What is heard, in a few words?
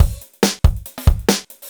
Loops BB 140, BreakBeats; Vengeance Samples